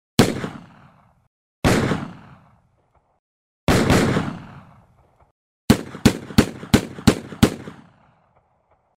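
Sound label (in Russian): револьвер стреляет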